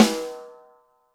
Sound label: Snare, Roland TD-20